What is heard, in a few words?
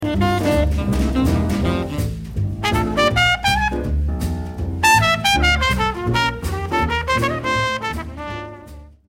solo